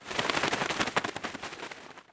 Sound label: pigeon wing flap